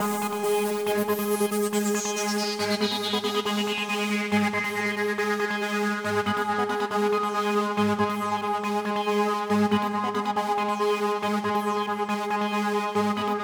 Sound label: Gatesynth, 139BPM, G# minor; Melody & Synth